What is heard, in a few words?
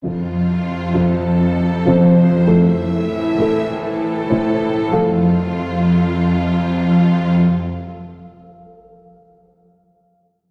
Relaxing Piano Strings Stinger